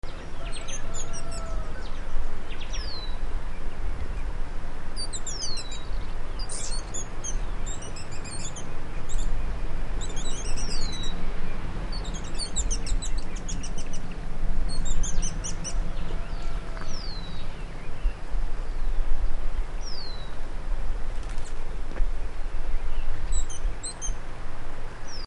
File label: amazilia hummingbird